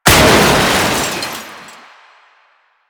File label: Car Metal Smash; Warframe; Ambience; Nineteen Ninety Nine